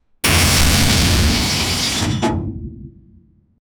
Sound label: heavy metal impact; burst